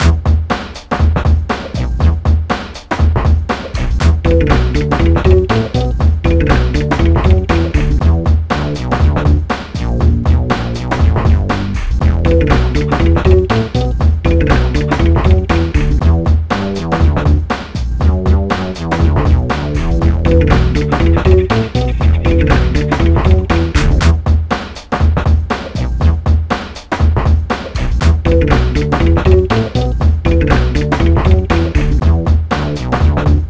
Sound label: Locker Music; NDS